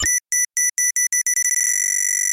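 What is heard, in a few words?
emergency alarm accelerating signal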